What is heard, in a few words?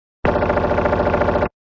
Short medium pitched machine gun burst.; Gun Shots